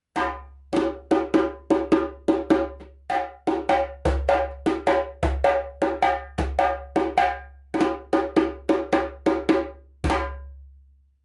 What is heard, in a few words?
djembe